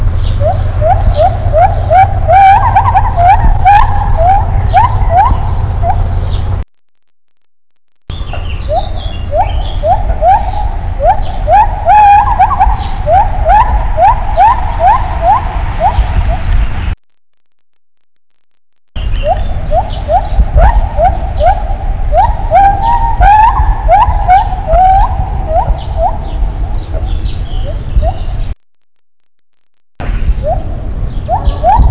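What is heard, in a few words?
agile gibbon male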